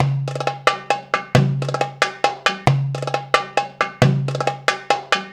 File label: PERC LP, Arabian Traditions